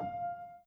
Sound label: Piano Chord